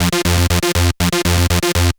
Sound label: Techno Synth